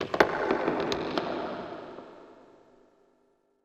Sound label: Gate Chamber Earth; ninjago